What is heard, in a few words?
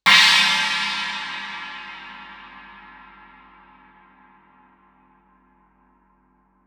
chinese stick shoulder ff stereo, Suspended Cymbals; Percussion